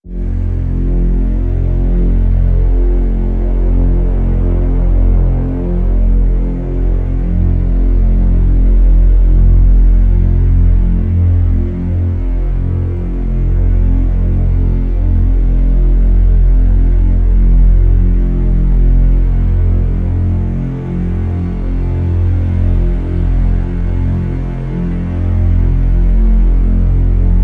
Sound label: Drn Ground Zero F